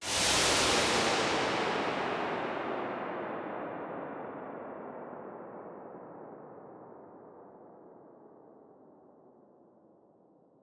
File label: Noise Verb Decays; stereo toolkit samples